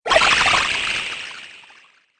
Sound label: Wacky Water Video Game; Video Game Sound Effects